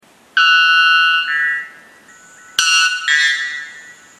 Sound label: bellbird